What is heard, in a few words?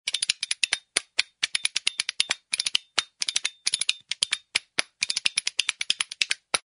rhythm spoon fast